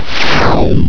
dissolve

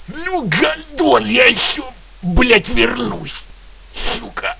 die; z-lapik ru

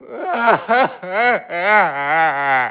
plk

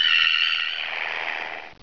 headcrab run

